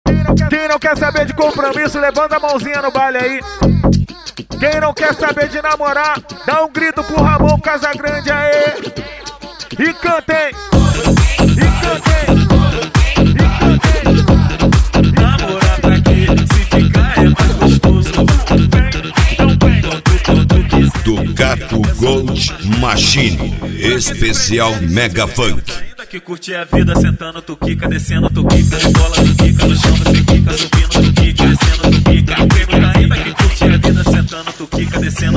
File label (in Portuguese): mega funk